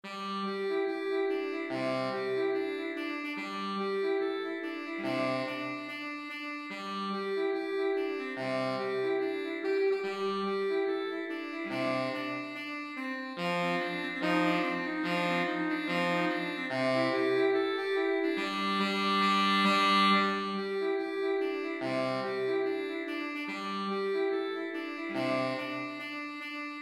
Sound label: sax